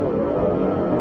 Wind; pokemon reborn e19 2022

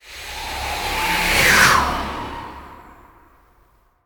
God Shockwave Opener; Coatlicue

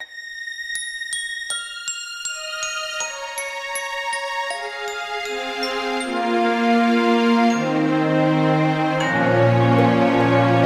Bgm Gameover; legend of ixtona rpg android game music

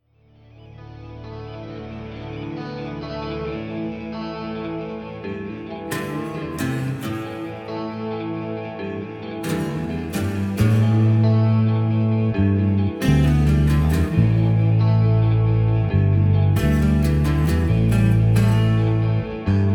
rock loop; starcraft terran1; Heroes of the Storm

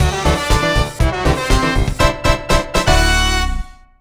Match Start; Centre Court Tennis; N64

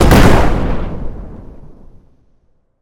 Explosion Large

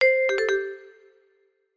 Jingles Cheese

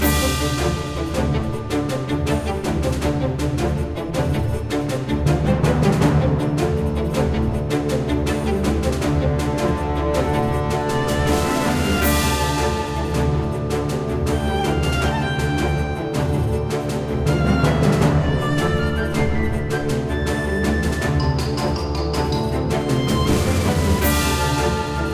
Battle Loop; Regular Battle; Steamworld Quest Hand of Gilgamech; nintendo switch

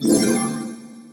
Hyper Gem Destroyed; bejeweled 2 deluxe

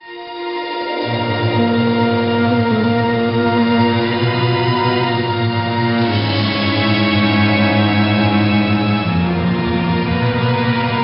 Defeat; Puzzle Chronicles; NDS

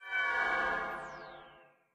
Swell; Friday the 13th: Killer Puzzle

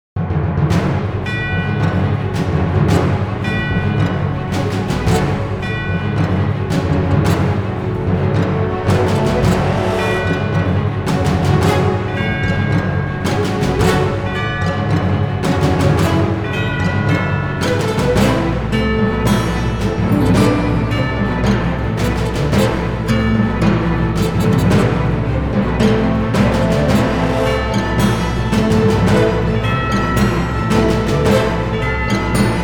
Dramatic

